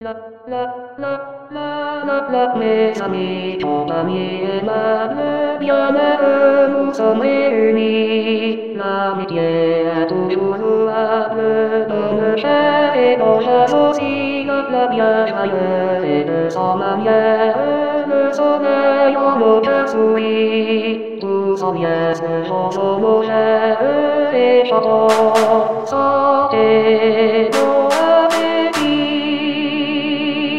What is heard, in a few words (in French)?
retrouvailles t b